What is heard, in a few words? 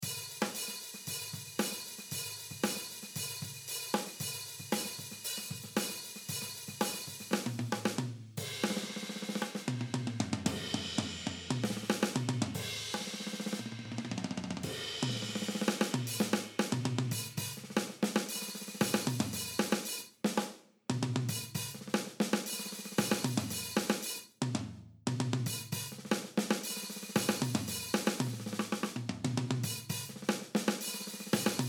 overhead wet